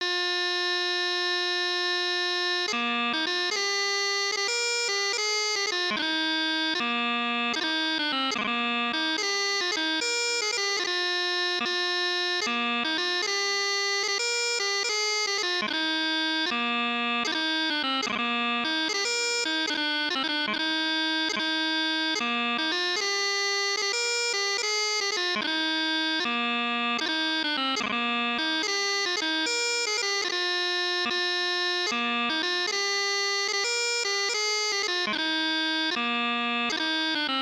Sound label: Highland Gathering Chanter, pipe band; Bathgate